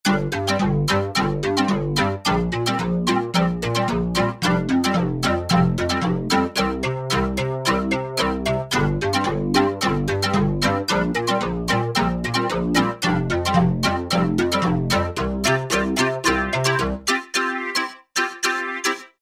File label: Reswacker, Korg Wavestation A-D